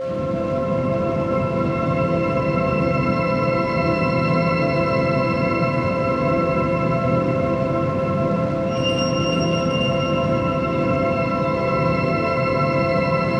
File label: 528 Hz Binaural Meditation